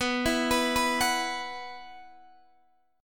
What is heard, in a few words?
B Suspended 4th, Guitar, Open D